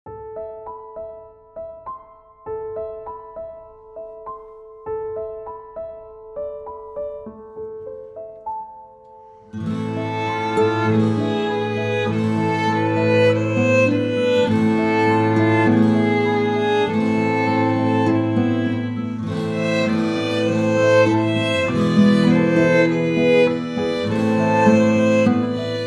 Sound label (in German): melodie in moll